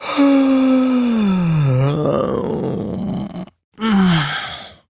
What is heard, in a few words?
Long Sigh, Son, Vocalizations; Warframe; Deimos